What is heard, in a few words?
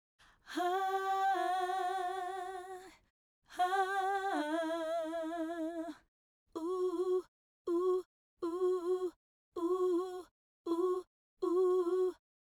Gmaj Dmaj Female Harmony